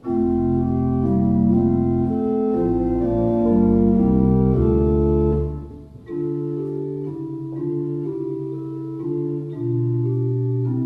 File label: Walcker, pipe organ